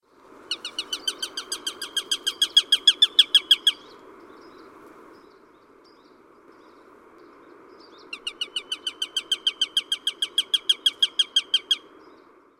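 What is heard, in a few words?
sparrowhawk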